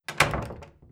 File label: door close